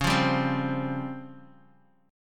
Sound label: Db Major 7th Flat 5th, Guitar Open D